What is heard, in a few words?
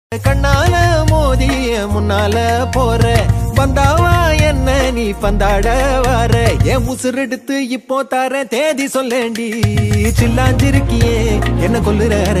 love ringtone love BGM